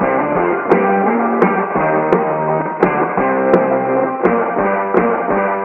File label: guitar, Loops; Phonky